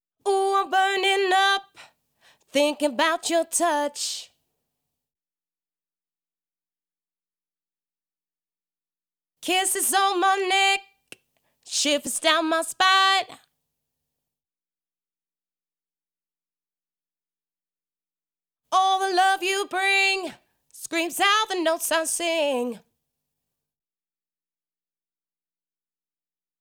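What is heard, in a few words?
Em Verse Female Vocal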